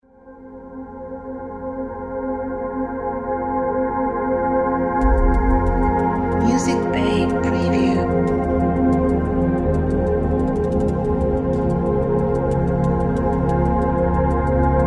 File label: Sonic Ocean